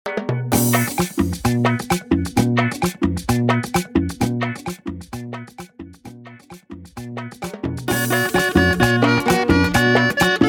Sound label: intro guitar; 130 bpm